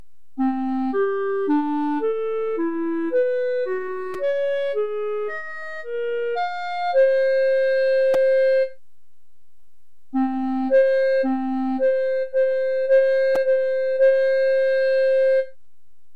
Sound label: circle of fifths and comma